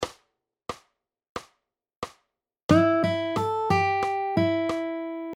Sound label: Pentatonic Riffs